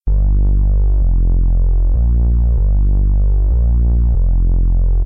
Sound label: Hip Hop Heavy Sub